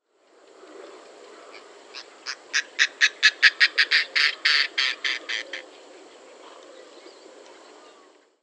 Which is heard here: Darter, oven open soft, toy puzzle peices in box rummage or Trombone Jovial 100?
Darter